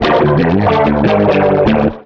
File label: Surf Guitar